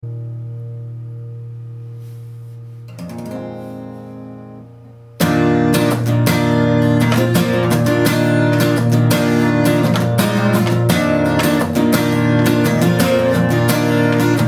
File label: Mingus Chords